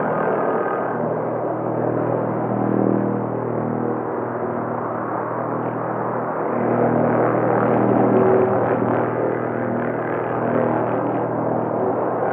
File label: Terraformer Egg Engine Loop; Props; Warframe; Tau; Ambience